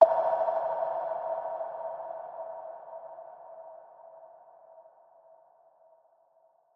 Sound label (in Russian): zvukovoj impuls radar ping